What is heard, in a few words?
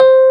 EP6 C4